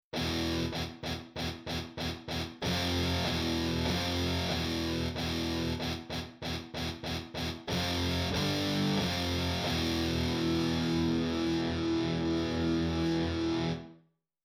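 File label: Fuzz Chords Sus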